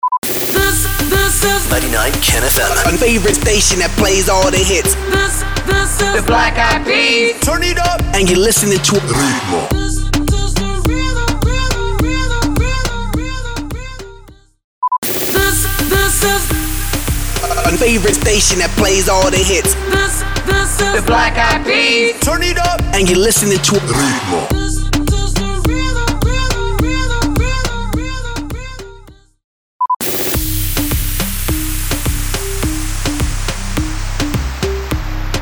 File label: POWER INTRO 105BPM